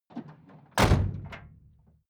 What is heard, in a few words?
van door close